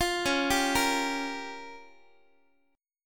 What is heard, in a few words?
Db 6th Flat 5th, Guitar; Db6b5; arpeggiated; x,x,8,11,11,9